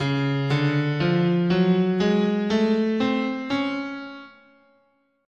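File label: D flat Double Harmonic Major, guitar, scale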